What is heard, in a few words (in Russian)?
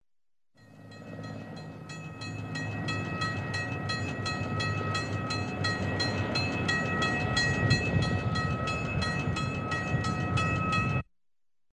zvuk zvonka na zheleznodorozhnom pereezde